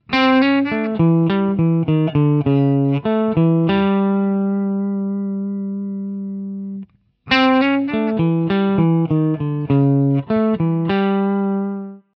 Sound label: Major 3rd Lick, guitar